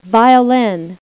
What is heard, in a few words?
violin